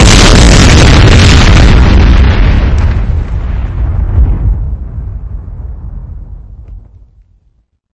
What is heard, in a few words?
nuke explode